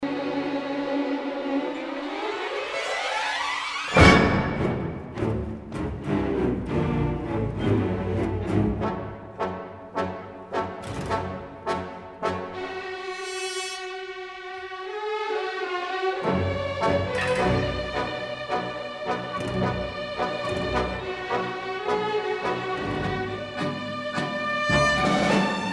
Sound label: TANGO